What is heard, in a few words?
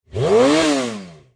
init car run